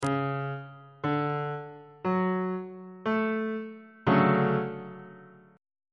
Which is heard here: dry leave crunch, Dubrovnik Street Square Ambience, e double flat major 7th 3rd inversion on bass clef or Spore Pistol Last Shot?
e double flat major 7th 3rd inversion on bass clef